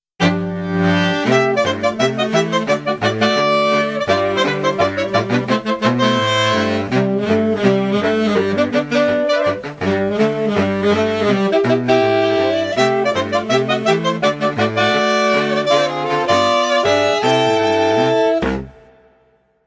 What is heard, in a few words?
TV theme jazz band, Sax; oxfordshire